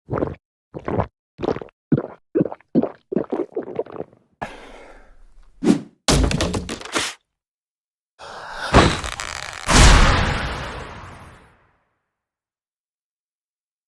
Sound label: transform